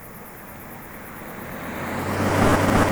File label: car